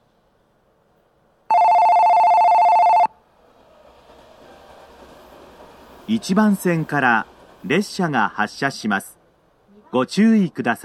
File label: otobashi, station; jr central